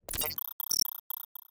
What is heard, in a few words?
scan